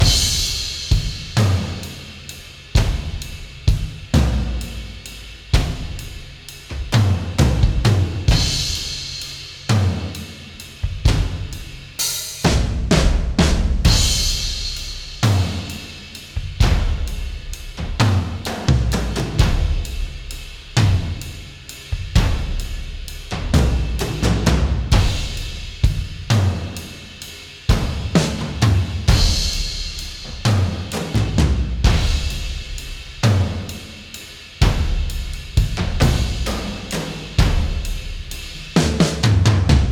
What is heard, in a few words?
Reverse Layer (Drums); The Binding of Isaac Repentance; Genesis II Reverse Basement Layer (Drums)